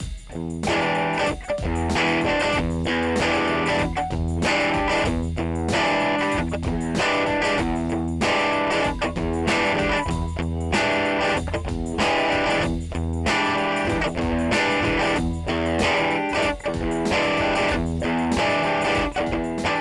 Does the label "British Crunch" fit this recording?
Yes